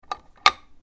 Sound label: Drawer Latch